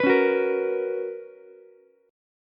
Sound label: B Major 7th Suspended 2nd Ukulele, chord; BM7sus2; strummed; JGuitar